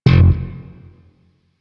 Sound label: Shawzin Slap Oct One F, Chords; Music; Warframe; Sentient Shawzin